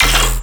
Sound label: Bass Hit E, Dubstep; Glare Bass; Ghosthack; AC2024 - Day 13 - Dubstep Sounds 2025